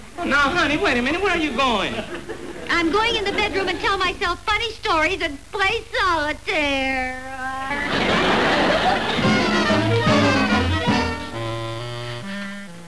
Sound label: cry